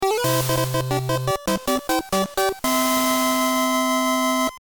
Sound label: floor clear; Adventures of Lolo 2